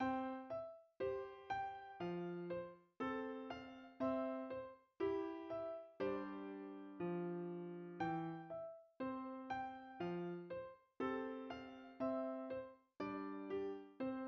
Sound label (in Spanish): musica; Mekanta